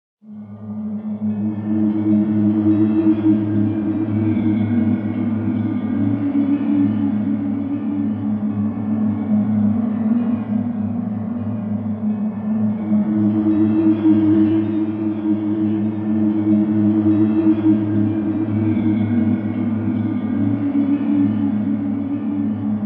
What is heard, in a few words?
Cave; ICO